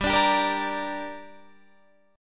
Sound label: G Minor G down Guitar Half Step Down, chord